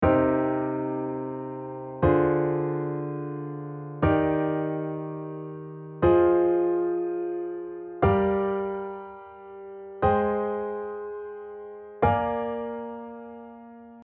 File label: Cmin Diatonic Chord